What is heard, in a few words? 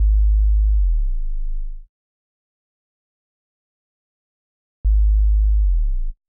Synth Bass; Basses